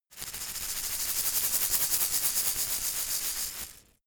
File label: insect shaker rattle dynamic